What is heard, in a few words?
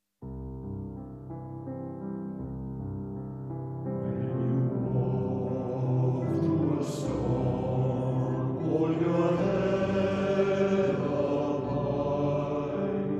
male choir